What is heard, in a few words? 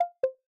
manner cancel, ui